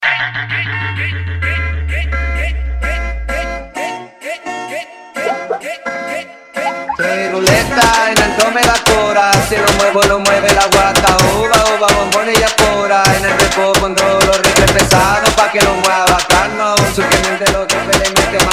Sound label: funk